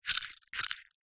leisure toy game water ring toss handheld button press